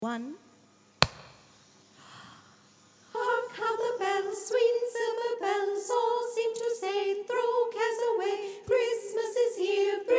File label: sop carol of the bells